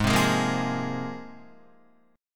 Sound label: Ab 6th Add 9th, Guitar; 4,3,6,3,5,3; Ab6add9 strummed